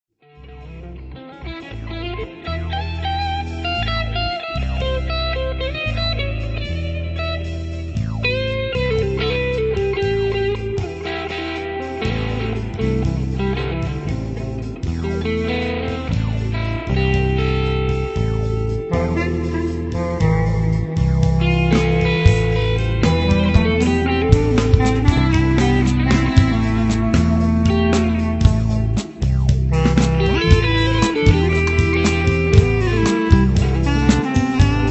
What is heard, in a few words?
Post Modern Lullaby